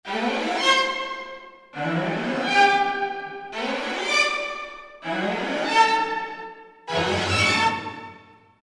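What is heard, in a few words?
String Scale Major; Roland SRX-05 Supreme Dance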